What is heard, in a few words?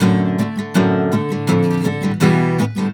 AM GTR, Celtic Flavours